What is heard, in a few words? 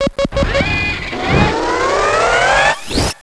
charge; vendetta; robocop